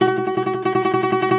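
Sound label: OUD tremolo, Plucked Strings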